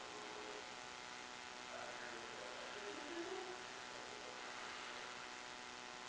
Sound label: female laughing; willow creek farm